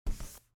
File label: organize; Nook BNTV250; ui